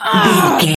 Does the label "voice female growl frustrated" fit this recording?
Yes